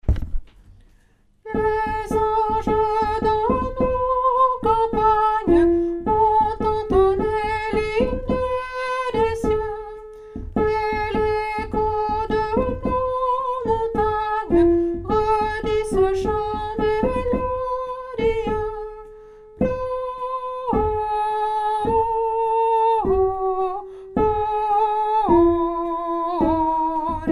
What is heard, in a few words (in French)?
les anges tenor; nouveaux noels; chants noel